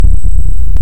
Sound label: Hydrophone; OneOcean